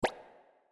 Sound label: ui select backwards deny; steamcommunity; saliengame